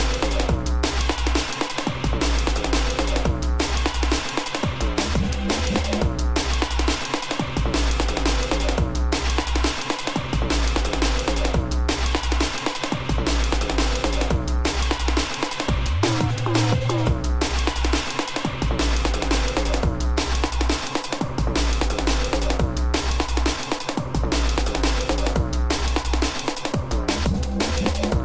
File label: Genotype Snare; Aggression Snare